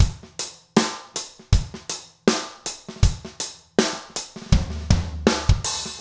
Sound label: trip hop